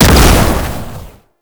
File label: Quick Strike Projectile Explosion; Enemies; Abilities; Warframe; Tau; Roathe